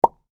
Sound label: pop